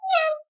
vfx Sad; nyan cat fly

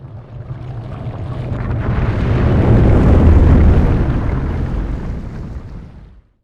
Whoosh

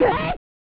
Yelling; Sailorjupiter

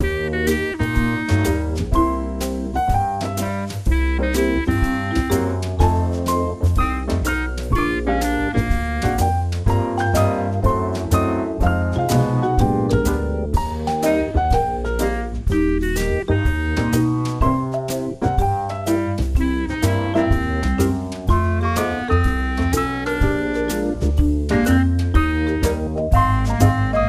serving music

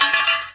Lamp Collision; angry birds rio

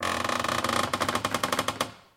random wood creak

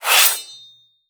warrior caution draw sword

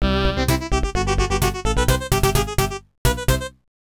Fanfare; Don Den Lover Vol. 1 Shiro Kuro Tsukeyo; arcade

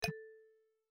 Chip Landing; My Cute Commissar

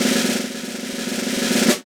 Ranking Drum; Nakayoshi All Stars Mezase Gakuen Idol; NDS

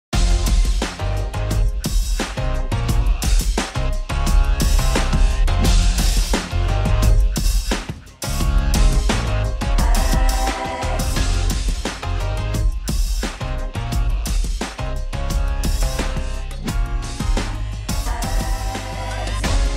Dance Music; Powerpuff Girls; Lego Dimensions

